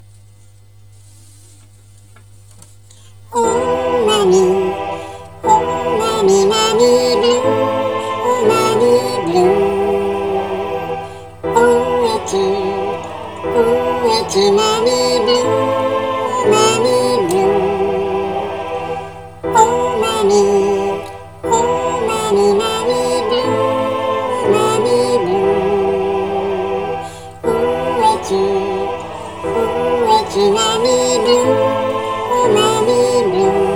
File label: alti